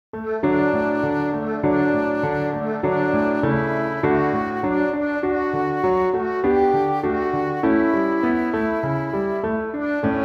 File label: instrumental